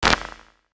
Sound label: Electro Magnetic One Shots